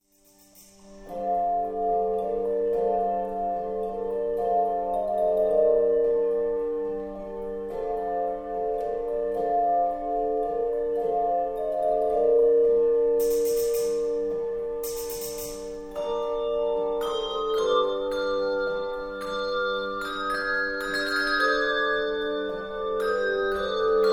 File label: danse arabe